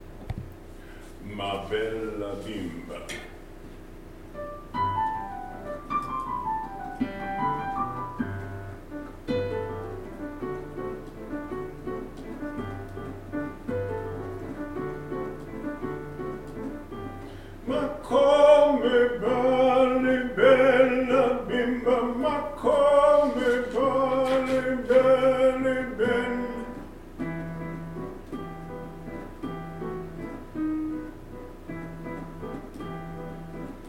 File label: ma bella bimba tenor; choir